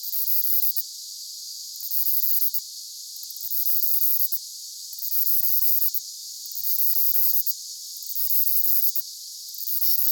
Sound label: Myopsalta mackinlayi Ceratodus